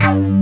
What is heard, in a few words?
PANNER BASS, Synthsonic; Pro-Rec - Synthsonic; BASSES